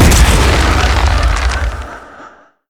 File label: bramble zone splash; Zyra